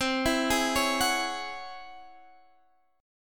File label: C sharp Minor Major 11th C, chord